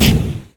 fire off

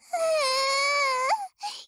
start; m4mod

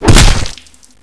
hit body

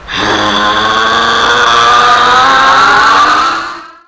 scatter beam charge; krillin

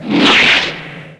air recover

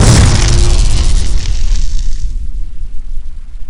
cannon impact; voidfold

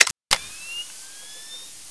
grenade prime

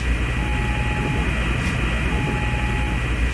robo amb

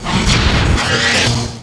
noise

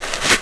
crawl, zombie

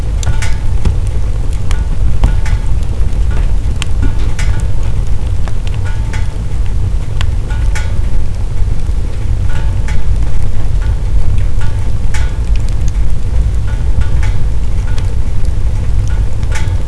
burning barrel

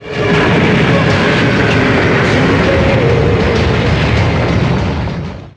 scream

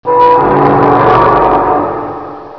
creak

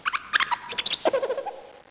rat idle

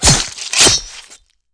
knife stab hit body

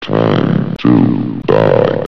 flamebot attack

